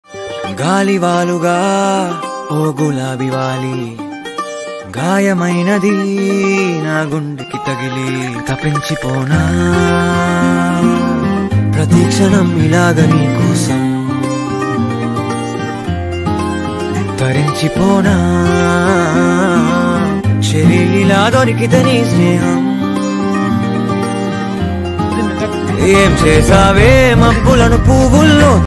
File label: ringtone telugu; agnyaathavaasi